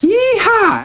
yeeha

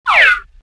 SLIDE

